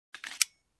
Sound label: Handgun cocking sound